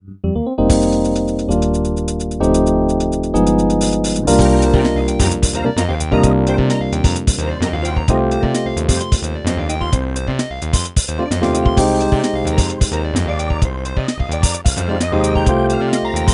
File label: Synthwaves